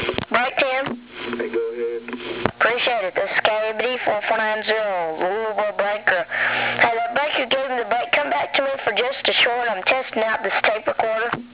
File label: breakten